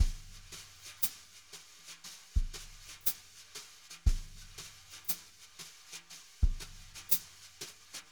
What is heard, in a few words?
jazz brush loops